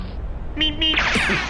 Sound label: Meep Meep